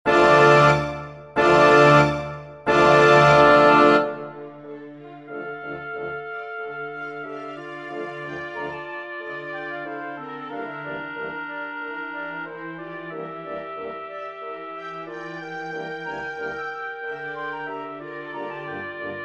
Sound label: test orchestration